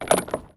cadenas end; yesterday origins gamerip 2016 switch ps4 pc ios macos

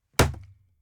BARRA FRIA

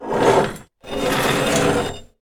MOVER ALCANTARILLA

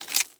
ABRIR HERRAM NAVAJA SUIZA; yesterday origins gamerip 2016 switch ps4 pc ios macos

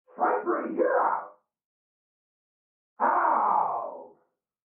fondo grito hombre

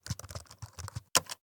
teclas enter corto; yesterday origins gamerip 2016 switch ps4 pc ios macos

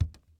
Paso descalzo parquet

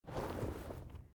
REPTAR TECHO BARCO; Yesterday Origins

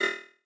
SFX No usar objeto

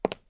Zapato tacon madera; yesterday origins gamerip 2016 switch ps4 pc ios macos

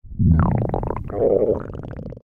Tripas corto

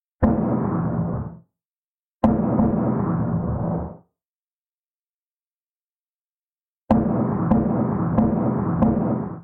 fondo disparos; Do Not Feed the Monkeys

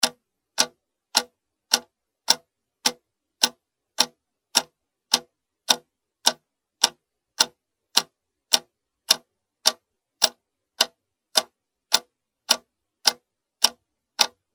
Reloj

